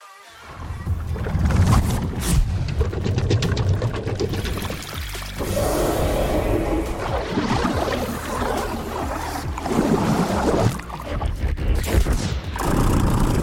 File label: surge